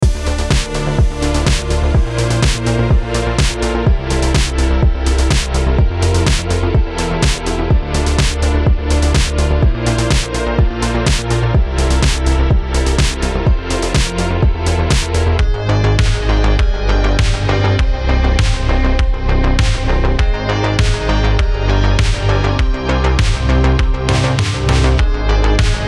darkwave sample pack; prodkult x dutchrevz

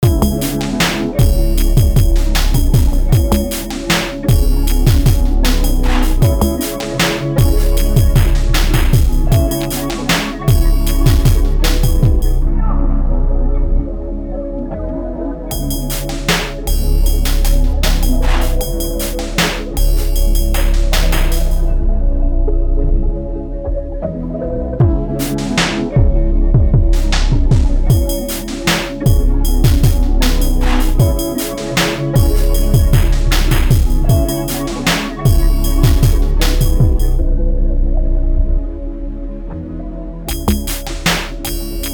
abstract percussion; macshooter

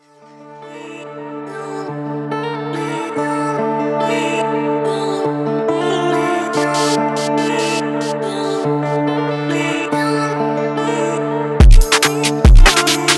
drill essentials; icons

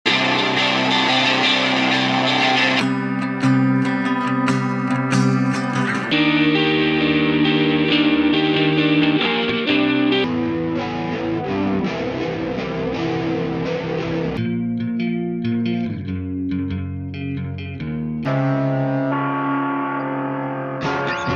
shoegaze guitars loop kit; nextime

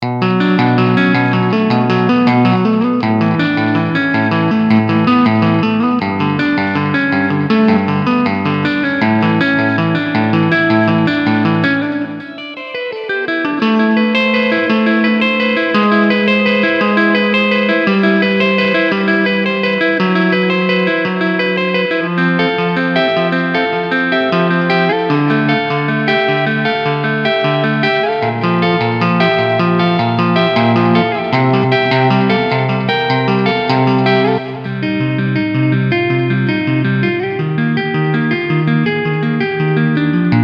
melodic guitar tapping; sammy boller

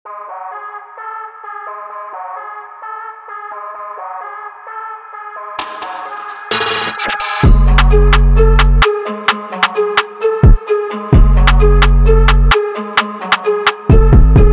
trap; trap time vol. 3

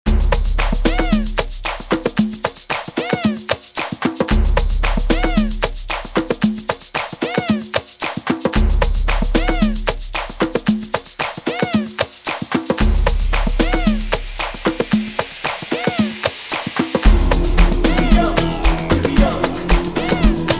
amapiano jams; fantastic beatz

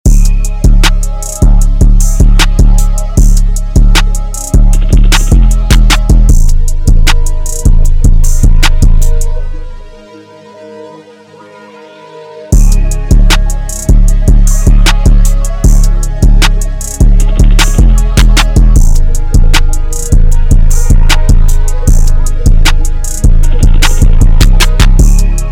drum kit; pooh beatz x go grizzly; manifest

